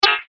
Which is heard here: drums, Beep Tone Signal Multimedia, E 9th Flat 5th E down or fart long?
Beep Tone Signal Multimedia